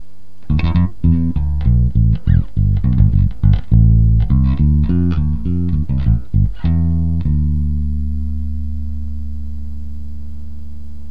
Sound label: tonebender clean; colorsound